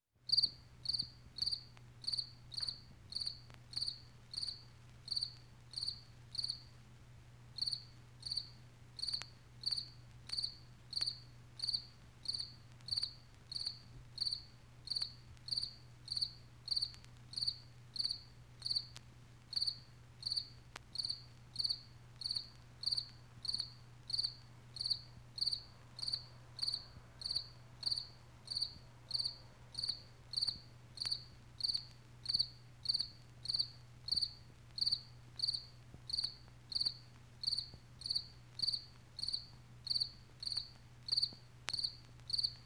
cricket

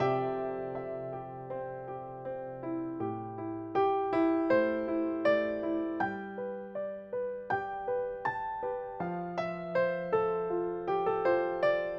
Delicate Piano

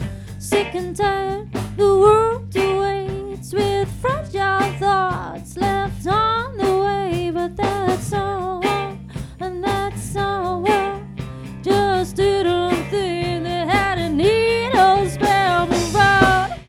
Lead Vox

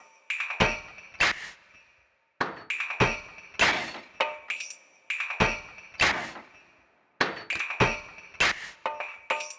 100 percussion; Low Tide; Ambient Piano Loops; C and Dm Night Mood

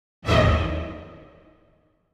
Sting, orchestral Stings

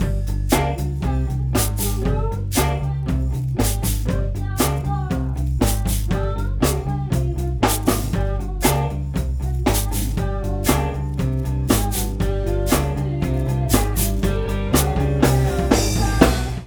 Percussion

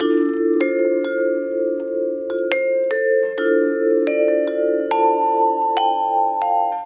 Lounge Vibes, loops

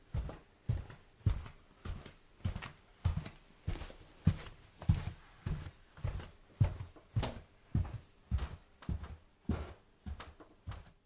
Footfalls Man Carpet On Wood; footsteps

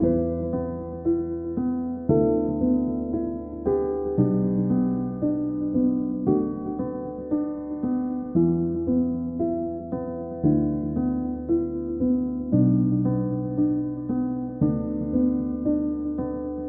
cinematic quiet struggle piano Fm 115bpm

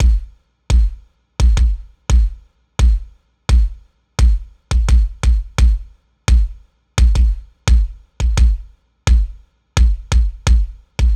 Kick, Synthwave; Synthwave Loops; Flamingo; Futurism

